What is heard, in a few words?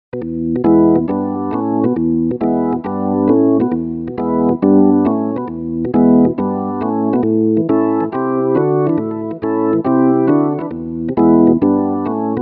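Green B Pedal, Roland SRX-07 Ultimate Keys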